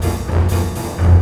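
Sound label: fairground 116; Orchestral Loops; Best Service ProSamples vol.33; AKAI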